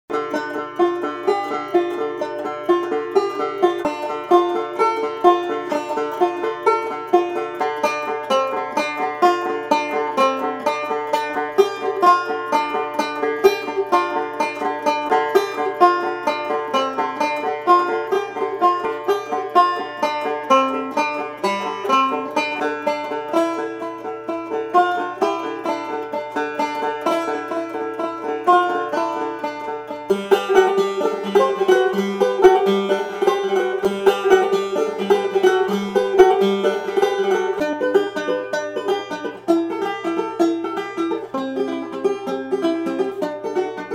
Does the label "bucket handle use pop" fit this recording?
No